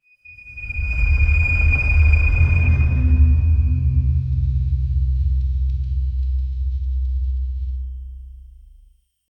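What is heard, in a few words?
cinematic crystal collapse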